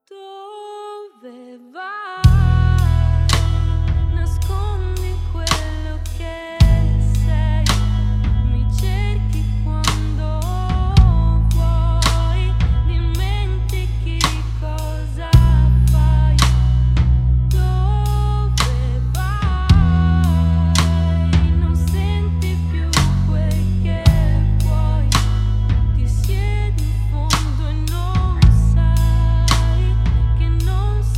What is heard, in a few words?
drums bass